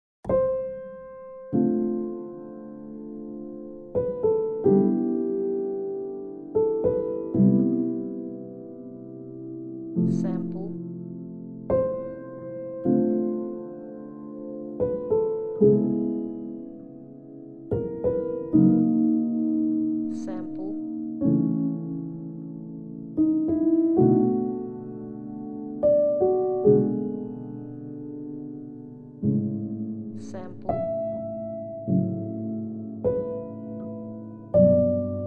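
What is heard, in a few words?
Sad Piano Cue Soft Piano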